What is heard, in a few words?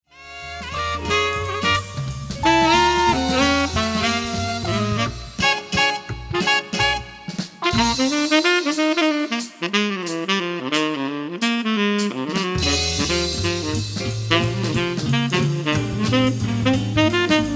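Tenor, sax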